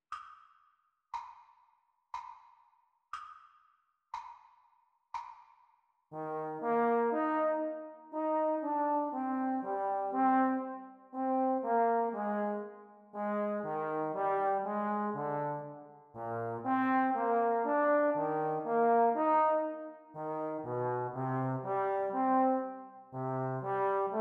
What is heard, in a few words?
Playalong for Eb Horn Practice Speed